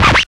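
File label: PER PROCU, Procussion, percussion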